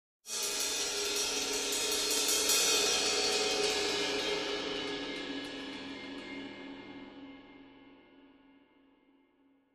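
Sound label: Cymbal Single Crescendo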